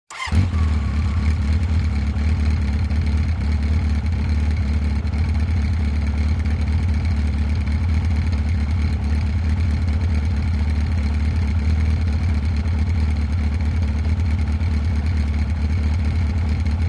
Motorcycle starts, waits, turns off

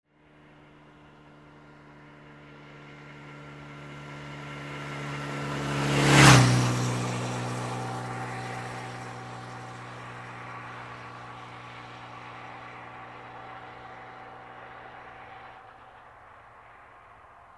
Motorcycle passes by

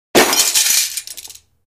glass breaking

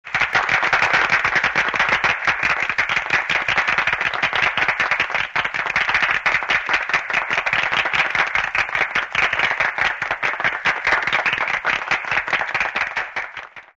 Applause in a small room; standing ovation

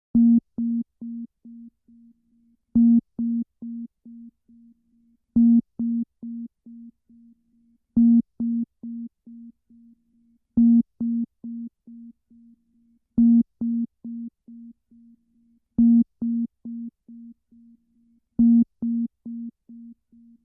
Submarine sonar

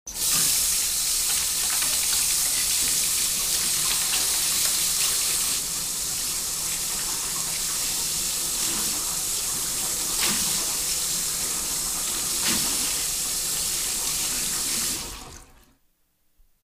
Water flows into a metal sink

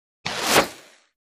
Bow, arrow flies by; cold weapons

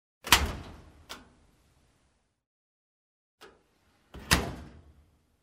The metal cabinet door opens, closes

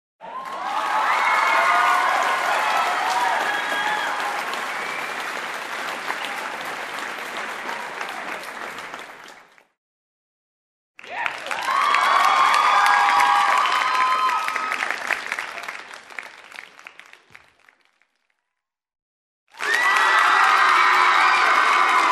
Loud ovation from a small crowd indoors; standing ovation

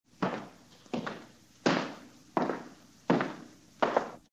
Steps on the floor in a house in the distance

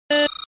information read signal

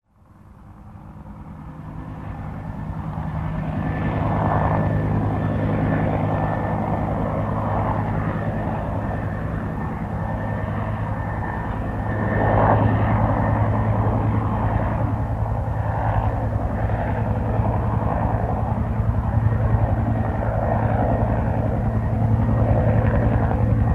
Helicopter flies in the distance

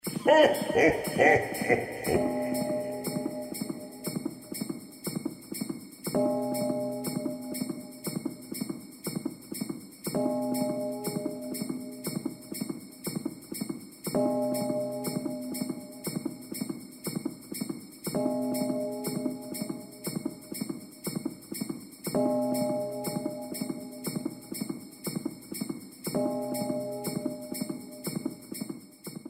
Santa claus laugh

